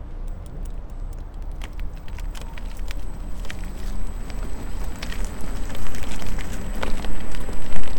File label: segway selfbalanced scooter runup onboard micro; eomys